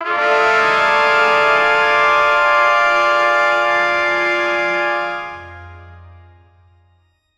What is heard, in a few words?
a sequence of three urgen, fanfare